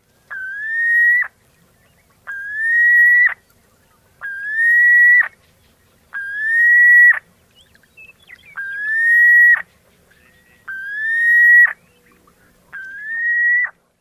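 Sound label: Piping Plover, courtship whistles song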